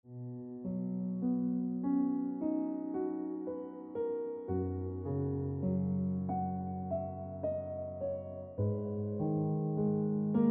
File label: Piano Music